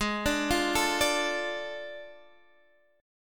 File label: D Minor Ab, chord; Dm/Ab arpeggiated; JGuitar